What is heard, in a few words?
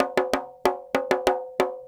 Petia, World Percussion